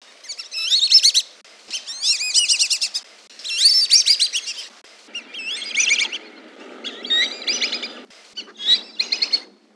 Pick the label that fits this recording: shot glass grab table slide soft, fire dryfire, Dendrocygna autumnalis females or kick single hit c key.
Dendrocygna autumnalis females